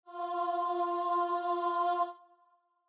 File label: starting note, voice; victory victory